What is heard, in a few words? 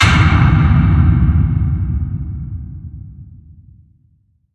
metal attack